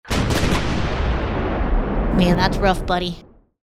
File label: cannon; pocket game